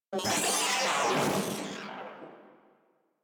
Vocal Death, Enemies; Warframe; Moa

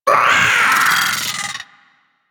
Vocal Attack; Warframe; Amalgam Moa

